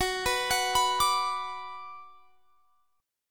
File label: F sharp Suspended 4th Sharp 5th; arpeggiated; x,21,21,23,24,22